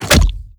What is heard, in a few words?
shut, zombie blind, jaws; Half Life Alyx; creatures